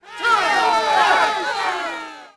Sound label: CROWD NEG MED; Cars; XBOX